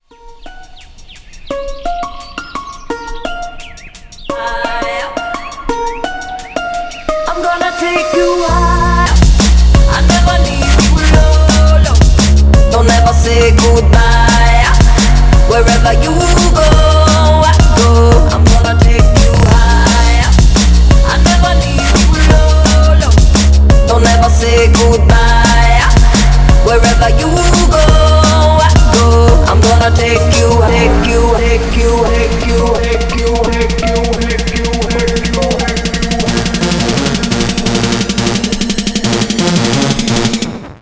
sputniq balalaika

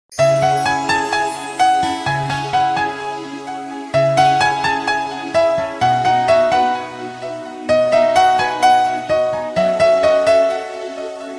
romanian piano

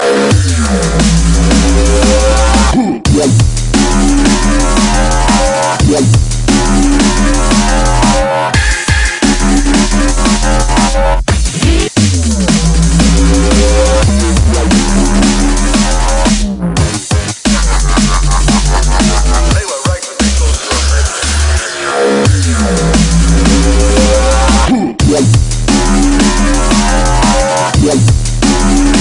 dnb ringtone

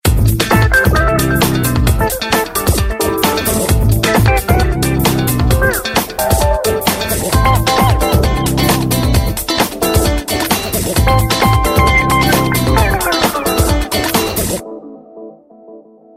galaxy note tone

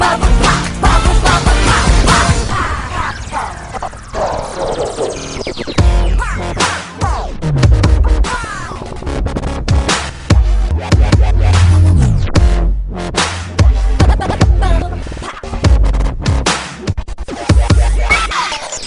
bubble pop dubstep remix

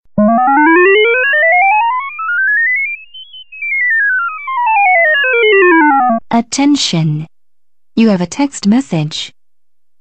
text alert

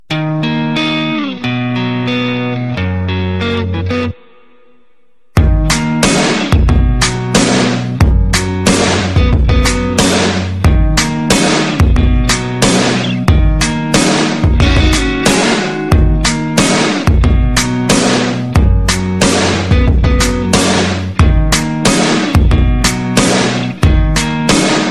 instrumental mix

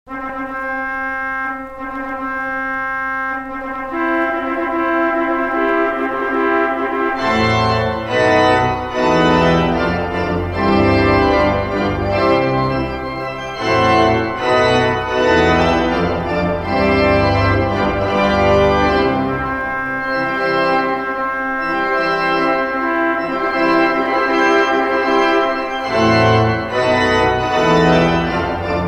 wedding march

